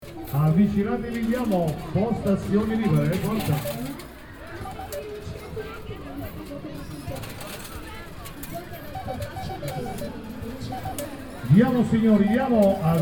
Alle giostre

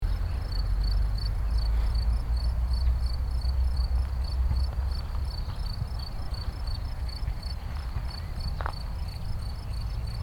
Parco urbano

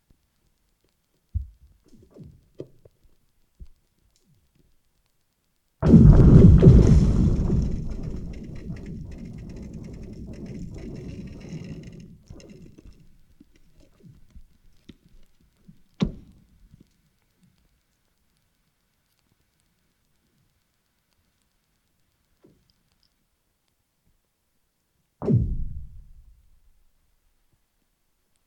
Ghiaccio

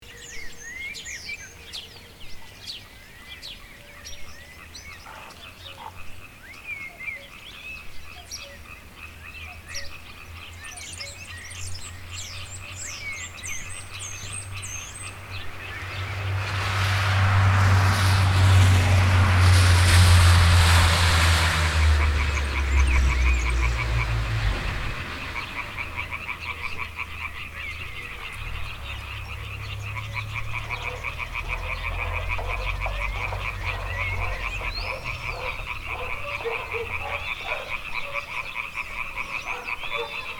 Gracidio domenicale

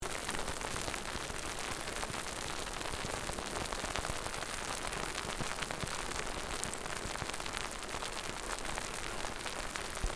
Fermentazione del mosto

